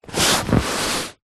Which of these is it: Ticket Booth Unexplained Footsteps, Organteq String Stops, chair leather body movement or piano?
chair leather body movement